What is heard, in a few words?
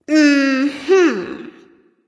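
nonverbal agree; smite; ratatoskr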